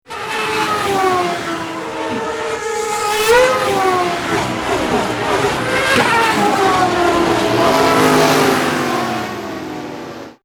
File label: hi fi racing